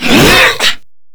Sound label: Exertion; Enemies; Vocals; Warframe; Grineer Hammer Boss